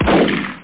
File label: shotgun; ampubeta